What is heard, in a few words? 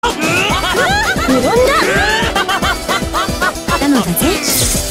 synced laughter